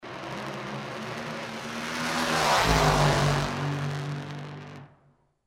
Funny Car Track, NHRA Track Sound Bytes, drag race